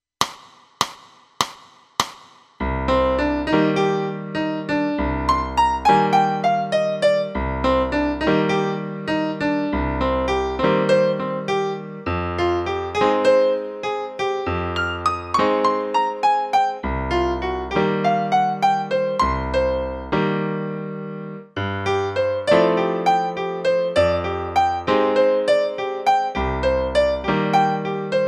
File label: piano